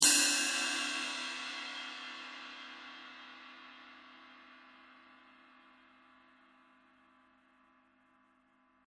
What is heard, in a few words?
cymbal